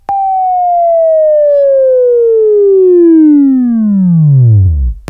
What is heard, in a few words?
Buzz, Right